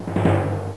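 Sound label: timpani roll; ES2DEMO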